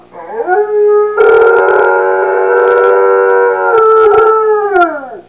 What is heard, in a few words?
howl